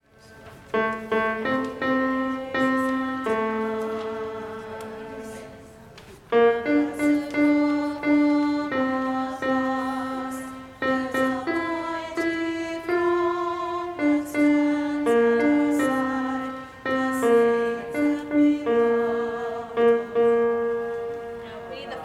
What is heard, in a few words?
Saints and Beloved of God alto refrain and final